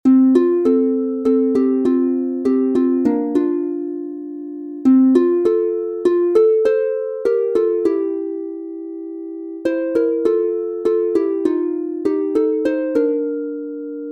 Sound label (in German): Red river valley F Dur instr, harfe